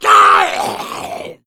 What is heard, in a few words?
hunter pain, zombie; Left 4 Fortress